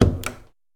Door Close; RPG Creation Kit; RPG Sounds Pack 6; qubodup

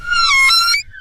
Door Close; Daz3D; UpstairsDoor

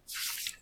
book next page; RPG Creation Kit

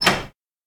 Door Open; RPG Creation Kit; RPG Sounds Pack 6; qubodup

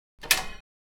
Interact Door Open; Opsive; UltimateCharacterController

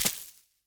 Footstep Grass; Opsive; UltimateCharacterController; Footsteps

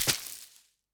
Footstep Grass; Opsive; UltimateCharacterController; Footsteps

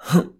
take damage; Player; RPG Creation Kit; RPG Sounds Pack 3

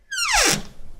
Cabinet Open, Furniture; Daz3D

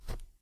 grassy footstep; RPG Creation Kit; RPG Sounds Pack 5

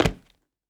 Footstep Tile; Opsive; UltimateCharacterController

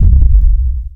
stomp; Opsive; UltimateCharacterController

